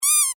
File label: Rubber Duck Squeak, Sad, Toy; Foley; Miscellaneous Toy